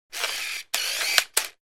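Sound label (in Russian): звук приближения zoom на фотоаппарате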